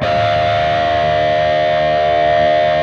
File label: PRS PINCH, Guitars